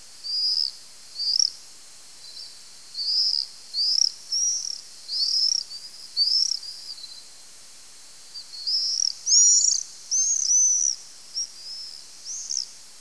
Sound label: swift, birds